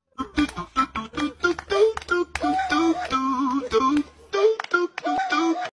black guy licks his lips

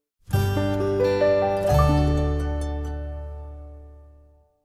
happy; sims 4

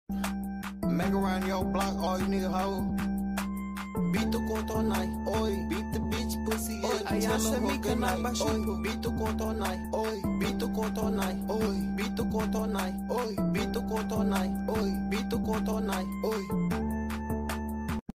beat

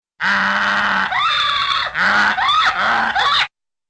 haw hee type donkey brays

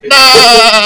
baaaaaaah

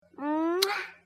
kisssssssssssssssssssssssssssssssss

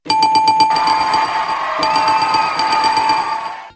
correct crowd

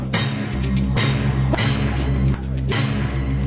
piano

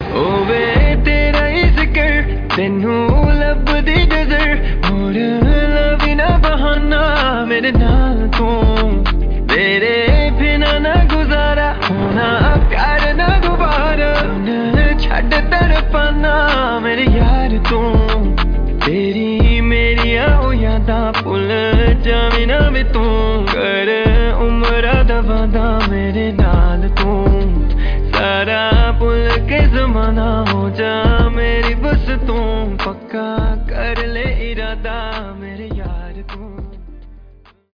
arabe music

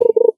fishing; stardew

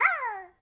slime ooooo; slime rancher

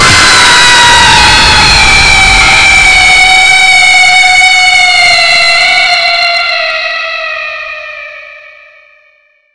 jumpscare

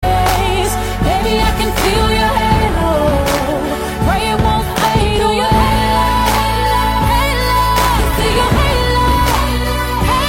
singing halo

